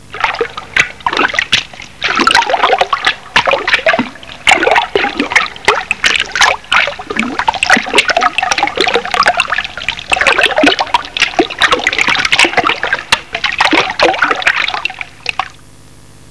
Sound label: Water Lapping